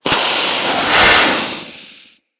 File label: Jetpack Charge Start; Grineer Jet Pack Sisters